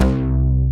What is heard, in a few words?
NOIZE BASS; Dance; BASS SOUNDS; Masterbits; Dance Trax